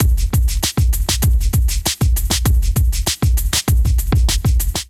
Groove 79 Bpm, Drums; MIDI Clips; Zoned; Ableton; Chop and Swing

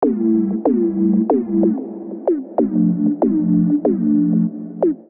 Chord Pad A Minor 94bpm; MIDI Clips; Music; Accompaniment; Climb; Ableton; Chop and Swing

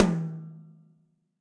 Tom High Direct Natural; Drums; Drum Hits; Ableton; Session Drums Studio; MPE

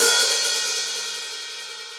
Hihat Open Reso Classic; Drum Hits; Standard Hits; Ableton; Factory Packs; Session Drums Club

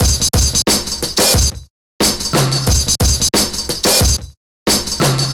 kit funky fresh flute; Drums; Ableton; Breakbeats by KutMasta Kurt

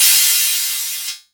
long OH; kidGlitch; Ableton Foundation FreePacks; Wicked Kits

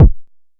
analog attacked, Kickbank

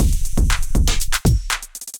Electrified Kit; Electrified Kits

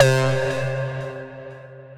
Clubber Lead, Synth Lead

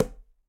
Quinto B Heel, Conga; Latin Percussion; Drums; Drum Hits; Ableton; Factory Packs

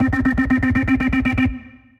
Full Bass Repeat, Synth Rhythmic

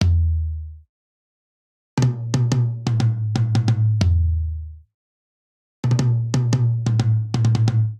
Swing Six RnB Toms Only 6/8 90 bpm, drum; Ableton; Factory Packs; Drum Booth

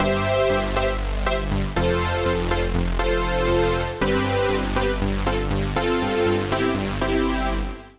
Poly Pad fast attack (C) 120bpm; Music Loops; Poly Magnet Pad; Ableton; Factory Packs; Singularities

